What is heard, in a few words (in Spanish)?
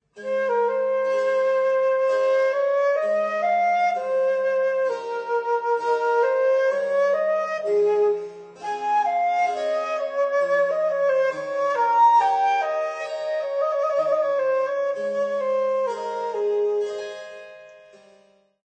Adagio concierto; Bach; repertorio para bodas